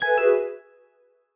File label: visa success